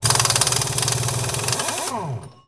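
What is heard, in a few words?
Impact small, air tools, industrial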